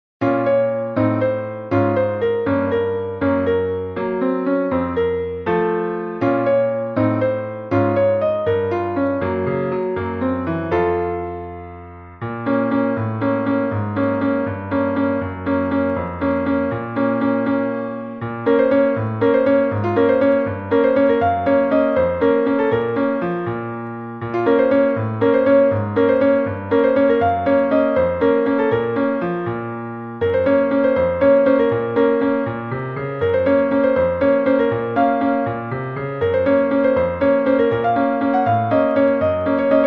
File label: noty fortepiano